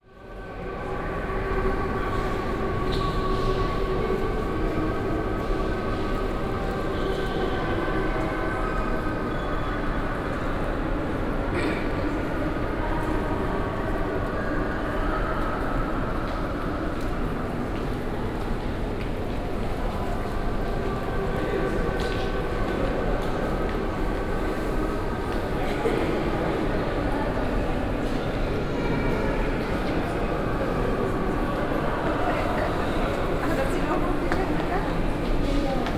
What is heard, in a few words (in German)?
istanbul Cisterna Basilica mit muezzin